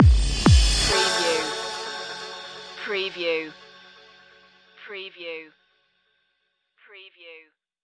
electronic progressions stinger

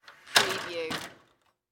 Ladder Open; sound-effects-bible

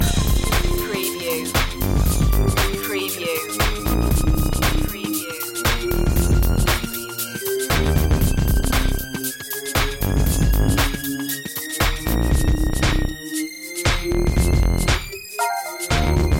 strange new funk loop

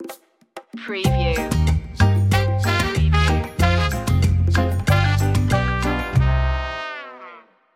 cuban sunset intro

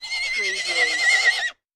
Horse Stallion Whinn; Sound Effects Bible

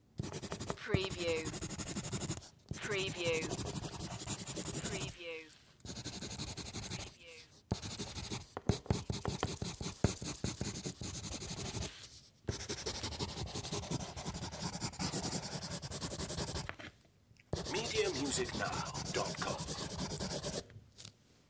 writing on paper with pencil

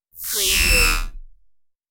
alien power charge

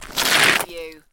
Snow and Ice, Ice hard gravel scrape fragments

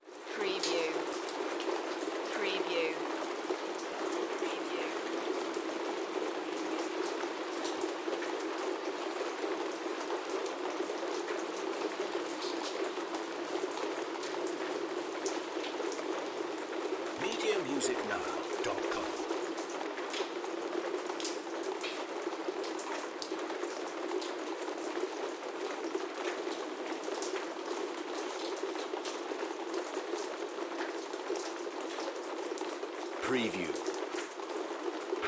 Rain Attic Interior

